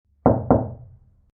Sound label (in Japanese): ノック音